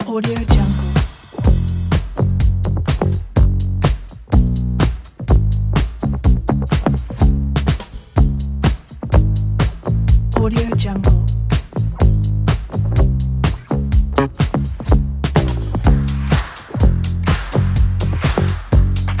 vintage bass

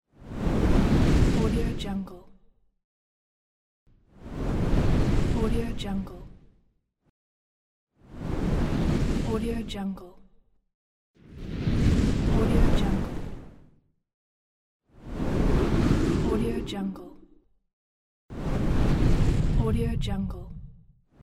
wind blow

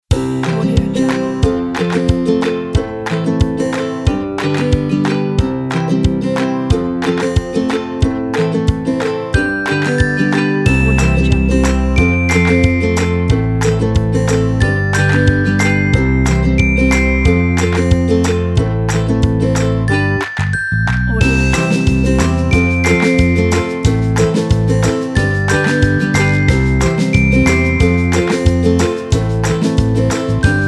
happy acoustic

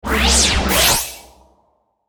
magic incantation

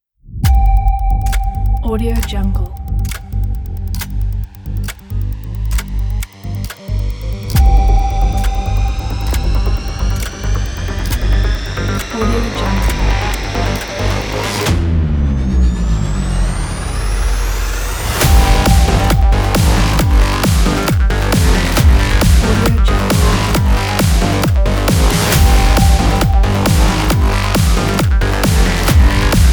dark electronic music

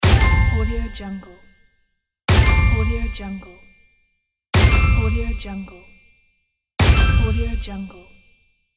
chinese power up

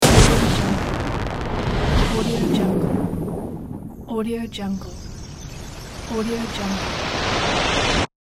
explosive logo

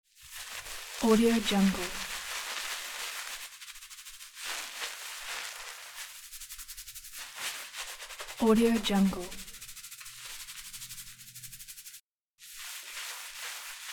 hair shampoo

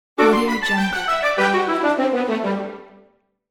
cartoon orchestral bright ending; kuksaudio

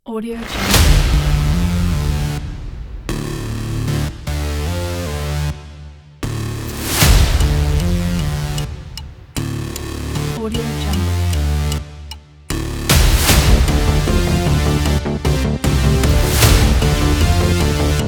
massive hybrid blockbuster trailer

